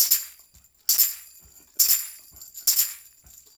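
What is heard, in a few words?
TAMB, tambourine; Drumdrops In Dub VOL-1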